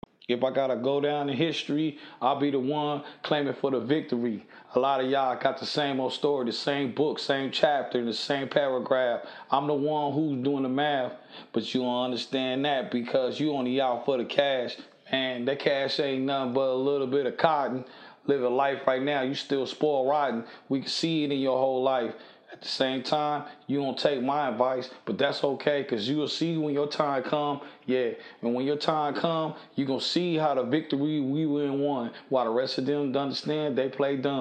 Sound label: Acapellas, hip hop 98 C sharp; Going Down History